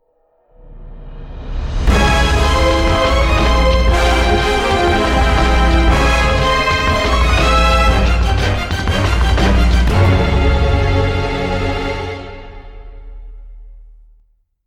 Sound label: round ten sec count, music